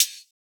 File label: Closed Hihat; Cymatics; Humble Hip Hop Sample Pack; Cymbals